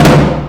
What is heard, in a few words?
ambient flam; Greytsounds VOL-1; PROCESSDRUMS